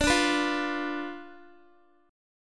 D Minor 6th Guitar; Dm6 strummed; x,17,14,16,17,x

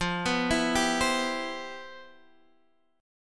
C Major 7th F; Cmaj7/F arpeggiated; JGuitar

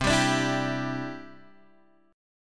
C-Major Add 9th, Guitar Open G

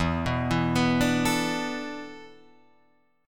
E 7th Suspended 4th E down Guitar Open F; arpeggiated

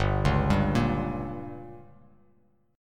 G Diminished

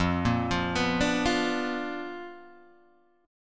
B Minor Major 7th Flat 5th, chord

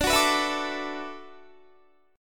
D 6th Add 9th, Guitar; D6add9 strummed; tuning 4E,4A,5D,5G,5C,6F

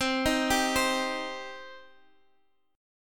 B sharp Minor

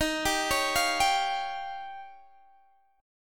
D sharp 9th D sharp, Guitar Open D

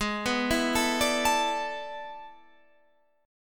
A Major 7th Suspended 2nd Suspended 4th Ab

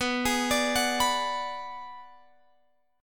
B Minor 6th B, Guitar Open F; Bm6 arpeggiated

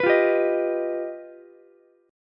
G Augmented 7th, Ukulele; G+7 strummed; JGuitar

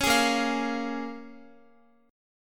A sharp Minor 7th, A sharp, down, Guitar; A#m7; strummed

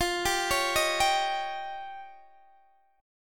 F 7th Suspended 2nd Sharp 5th F Guitar Open D

